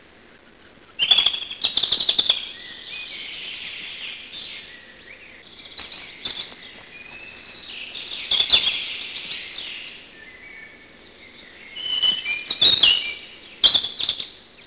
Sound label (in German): rotkehlchen; park; voegel